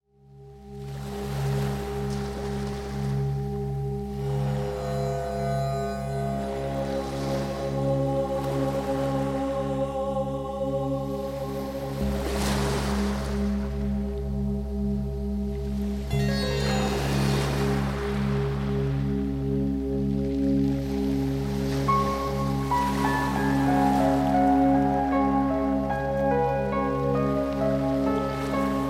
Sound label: Yoga OM Ocean